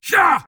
Grunt Attack; Paladins; Androxus

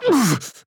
Grunt Hit; MalDamba

